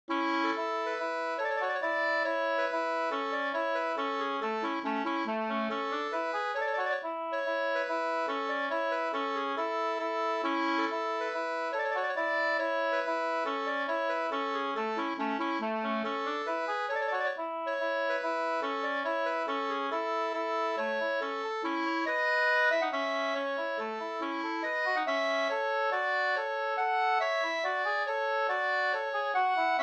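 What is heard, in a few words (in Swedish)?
polska, tvåstämmig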